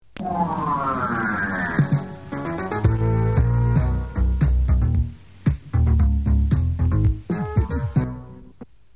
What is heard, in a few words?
radio one jingle